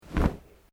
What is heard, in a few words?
Clothing Kimono Sleeve Flap; Movemement sounds for various articles of clothing; Dressing; Human